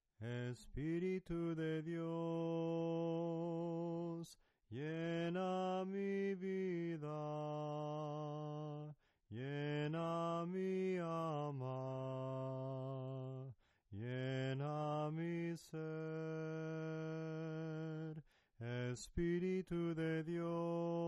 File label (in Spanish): SOPRANO CANTADO, himnos en melodia soprano